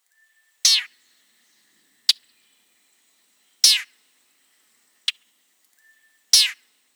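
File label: sounds of icterid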